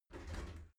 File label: shower slide door slide short close